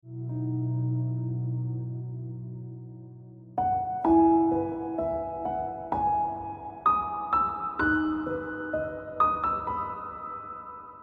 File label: Ringtone